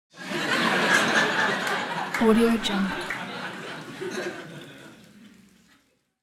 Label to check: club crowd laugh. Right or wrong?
Right